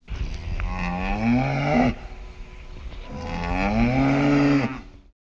cow

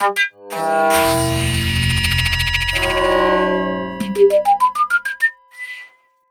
flute stac

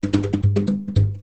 conga

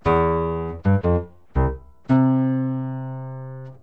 piano

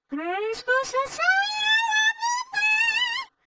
soprano

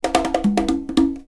conga original

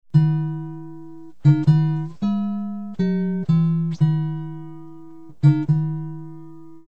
guitar

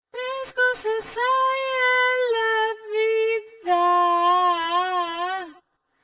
soprano

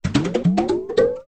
conga

